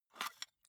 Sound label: cassette case open medium; objekte; kassette